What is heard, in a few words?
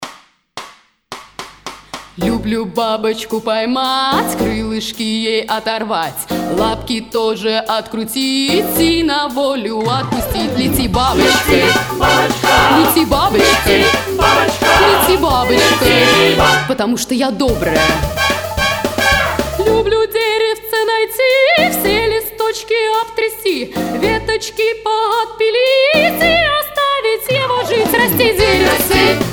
rock n roll